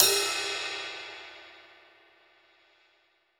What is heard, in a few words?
Rock Ride, cymbals; Roland BK-5